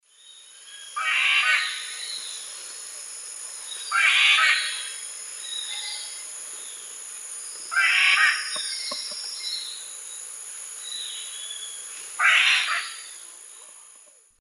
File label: Cacicus solitarius, calls; S. America; Eastern Lowlands